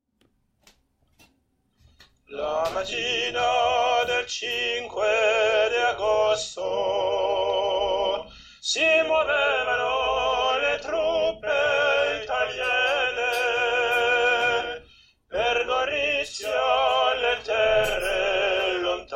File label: soprano